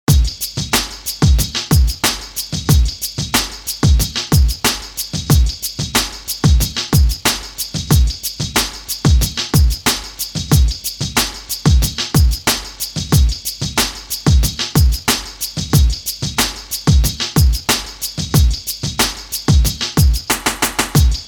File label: beats bpm 92